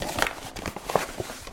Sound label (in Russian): gluhoj zvuk shurshaniya bumagami v yashike stola